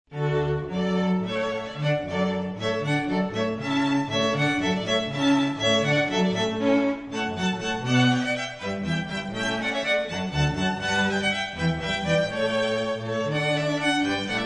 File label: Hornpipe